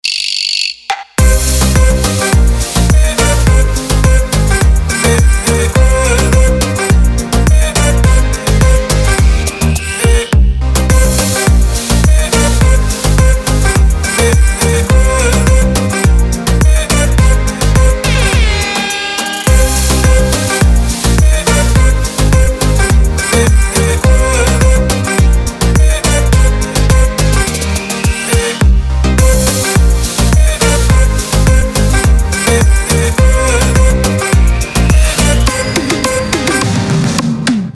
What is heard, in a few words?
loop